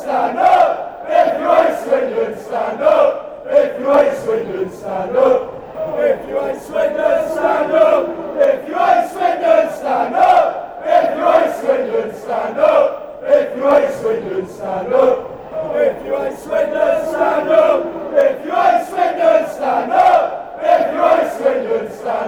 stand if you hate swindon, fanchants; oxford

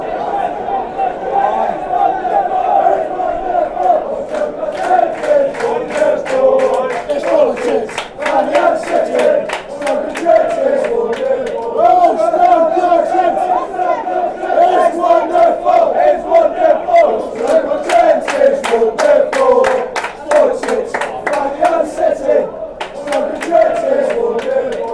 oh stoke on trent fanchants

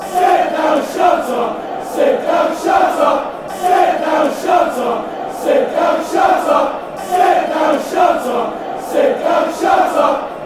sit down shut, fanchants; ipswich

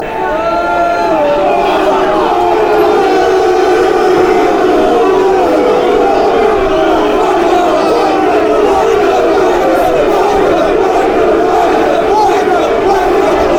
boo, fanchants; bournemouth

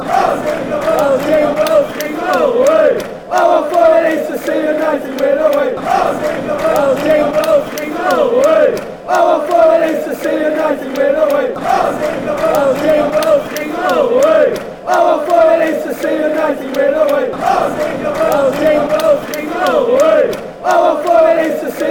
jingle bells, fanchants

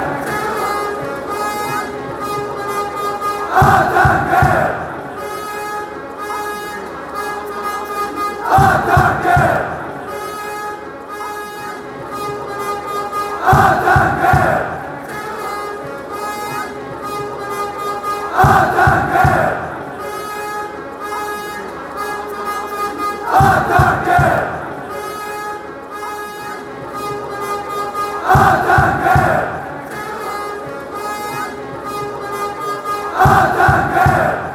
attacke fanchants

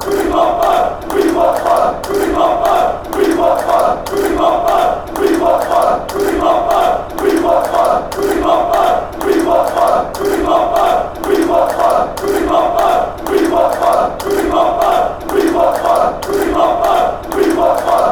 we want more fanchants

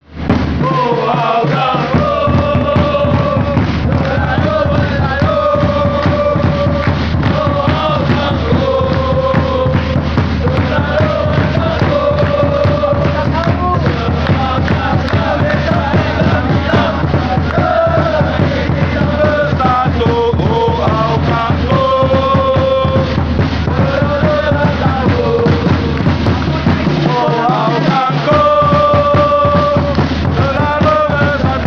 fanchants; hougang selalu bersatu

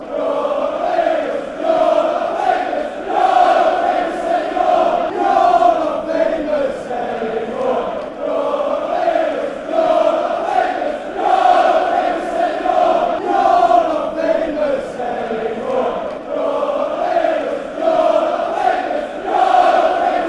you're not famous anymore fanchants; wafc

